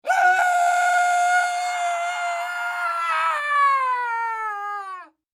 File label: Scream Horror Blood Curdling Falling Dry; Scream Blood Curdling Falling Agony Dry; Horror Terror; Screaming; Voice